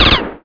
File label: vektor; SpecOps2MPlayerDemo